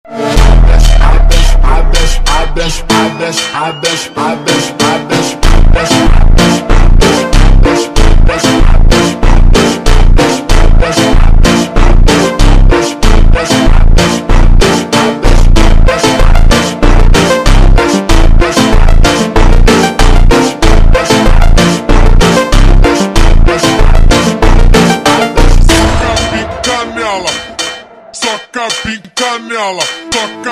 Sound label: Funk ultra Slowed